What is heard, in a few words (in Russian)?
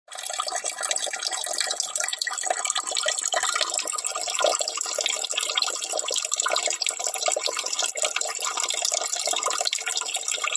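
вода течет в раковину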